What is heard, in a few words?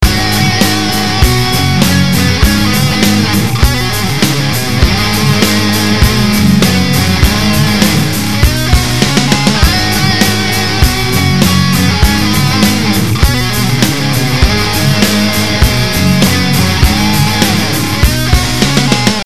Groove Track Melody